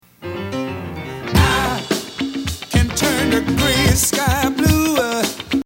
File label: music